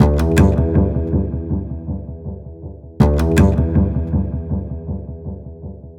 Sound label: Remixed Acoustic Bass